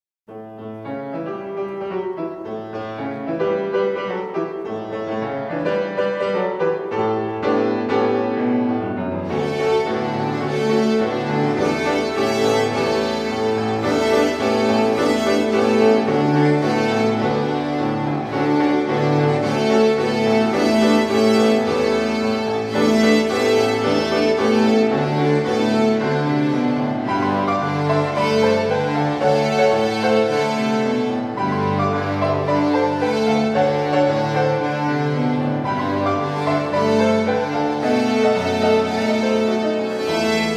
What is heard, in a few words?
Open String Samba